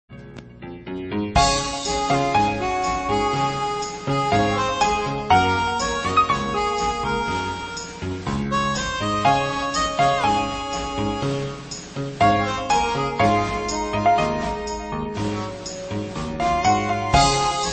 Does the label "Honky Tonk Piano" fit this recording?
Yes